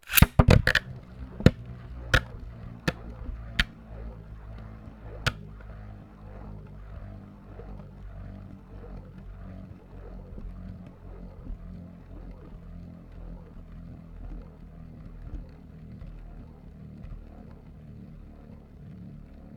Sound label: bayblade; NS Studios - ultra sound collection